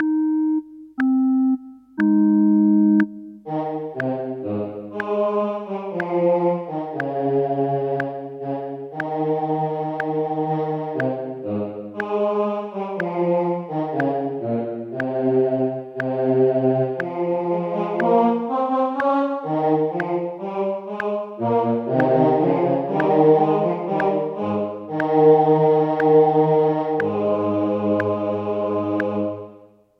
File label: O clap your hands; Gibbons